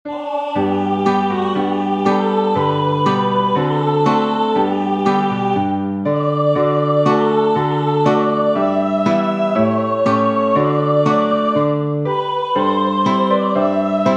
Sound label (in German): Kuhreihen, alphorns